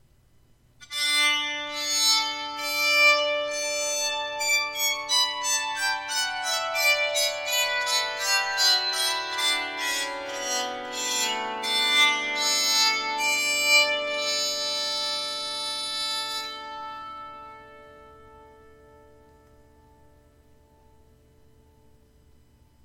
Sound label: scale, bowed psaltery